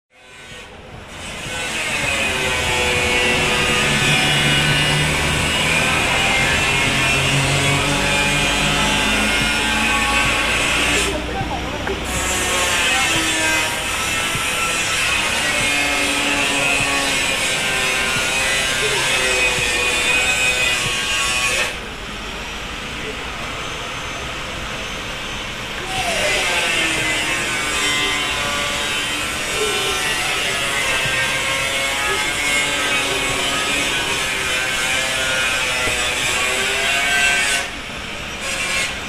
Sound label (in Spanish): calles; aurora y cafferata